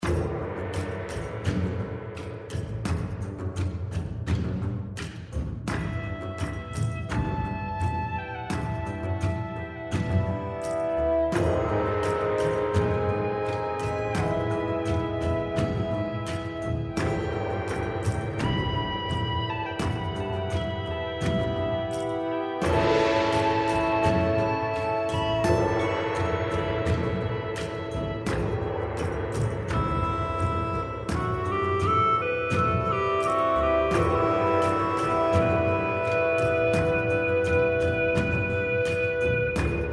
general Music layer; spin; Champions of Valhalla

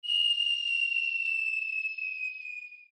bonus flying whistle; Highland Reels

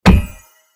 hammer hit; Crystal Furnace

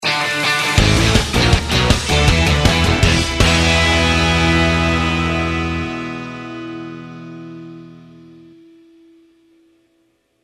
you won sfx; Football! LuckyTap; Eyecon

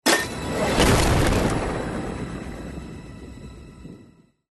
trident land; Champions of Poseidon

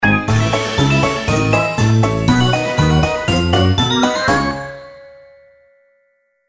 mega Win; Fluffy Favourites Megaways; win-levels

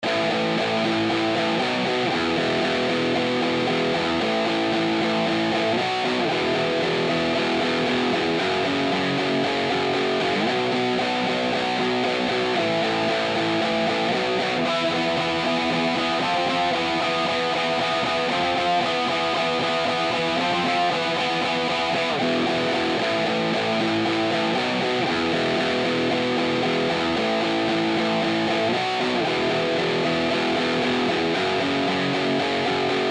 rock chords; Lucha Rumble

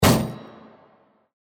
shield land; Champions of Valhalla

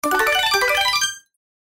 bonus prize big; Highland Reels

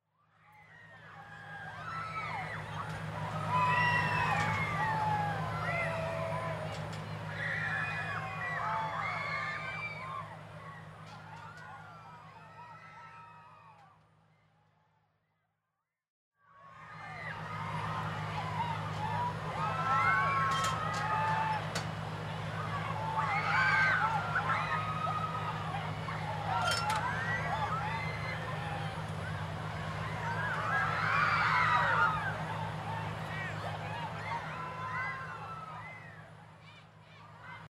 music background ambience rides; Lucky's Fish and Chips

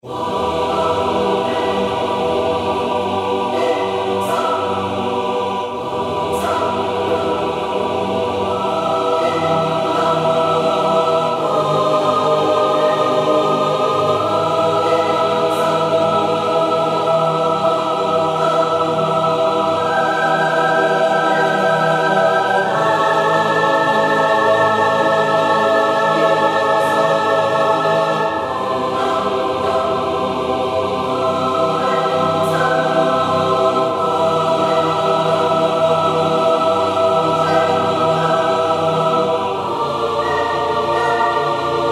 general Music layer; Champions of Valhalla

